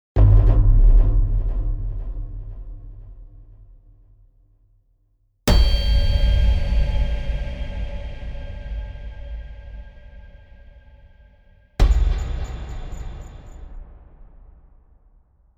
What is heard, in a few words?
Impacts Big Hard Low